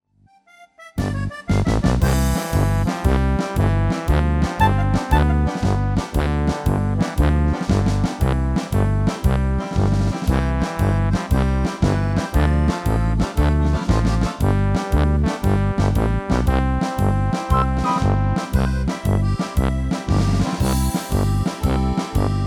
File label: MIDI